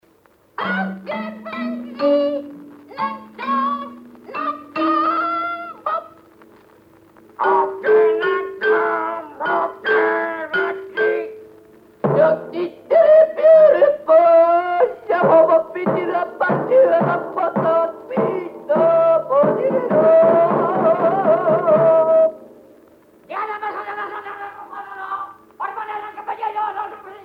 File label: Sound Effects banging